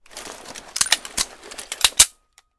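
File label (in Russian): установка обоймы в пистолет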